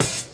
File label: open, hh